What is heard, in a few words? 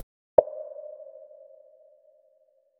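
three step ascending electronic, percussive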